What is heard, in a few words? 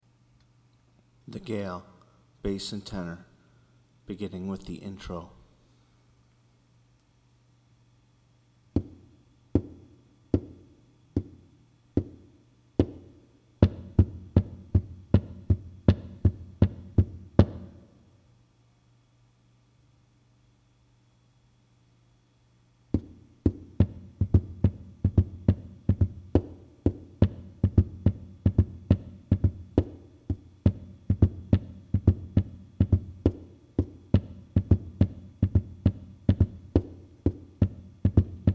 Tenor Bass